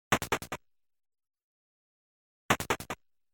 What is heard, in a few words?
Ascending & Descending Stairs Sound; Kaijuu Densetsu The Tale of the Shell Monsters